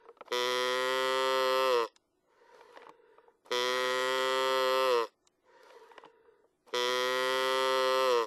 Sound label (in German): zugstimme